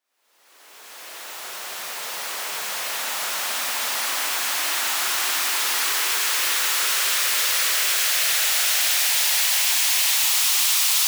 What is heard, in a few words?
Future Bass White Noise Up; FX